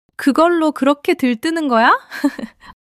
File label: laughter